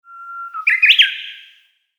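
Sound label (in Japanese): ウグイス, 鳴き声 鳥 野鳥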